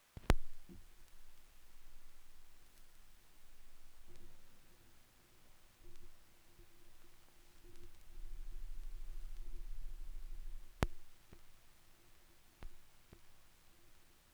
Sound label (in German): schallplattengeraeusche